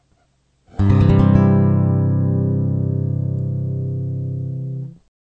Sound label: code c, guitar